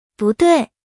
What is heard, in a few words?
wrong